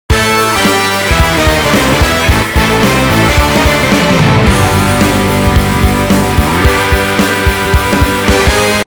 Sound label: Rock Star; Sanyo SCP-6780 Innuendo